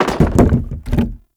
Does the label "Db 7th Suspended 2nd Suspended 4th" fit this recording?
No